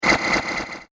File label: Industrial Machine Atmosphere, scary soundscape; horror